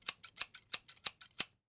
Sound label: Scissors; 1001 Sound Effects